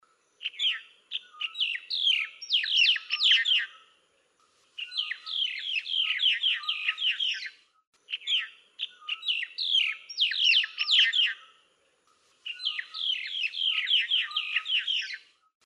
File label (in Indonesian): suara asli jalak nias